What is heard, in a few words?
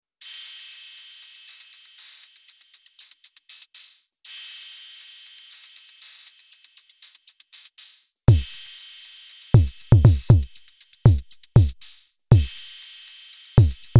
Stepmod